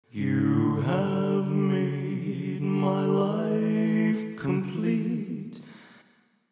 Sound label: klon fifth